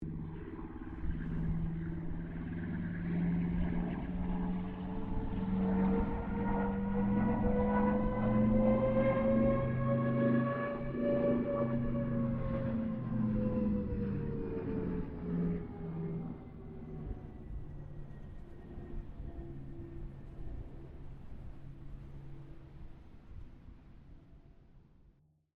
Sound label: AIRCRAFT CA-13 BOOMERANG PASS DISTANT